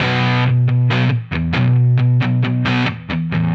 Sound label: Dist Gtr